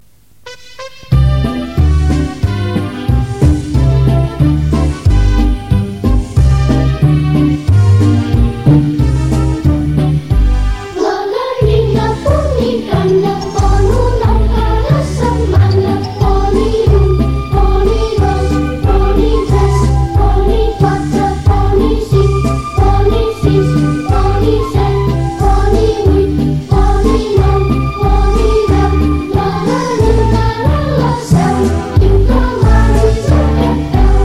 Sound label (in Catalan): La gallina ponicana Flab